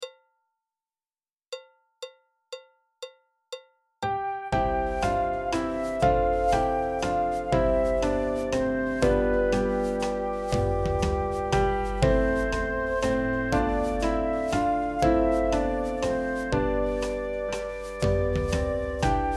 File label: Laredo Backing Track, folk song; The Streets of Laredo